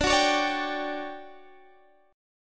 D Major 11th Guitar Standard, chord; strummed; JGuitar

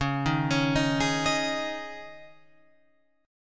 Db Suspended 2nd Guitar Open F; arpeggiated; 13,10,13,10,11,10

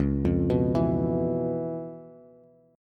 Db 7th Sharp 9th Db down Bass; Db7#9 arpeggiated; JGuitar

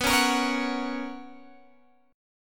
B Major 7th Suspended 2nd Guitar Open G, chord

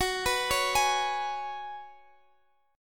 Gb Suspended 2nd Suspended 4th, Guitar, Standard; arpeggiated; capo 9; chordshape

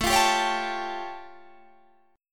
A Augmented 7th A

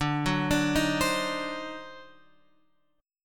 D 7th Flat 9th D down Guitar Open F; D7b9 arpeggiated; JGuitar

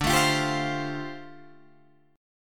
D Minor 11th D down Guitar DADGAD

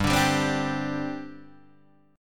G Suspended 4th, Guitar, Half Step Down

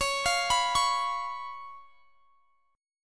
C sharp 7th; C#7; arpeggiated

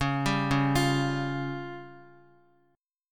Db Major Flat 5th, Guitar; arpeggiated; JGuitar

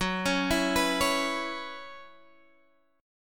B Suspended 2nd Suspended 4th, Gb, chord

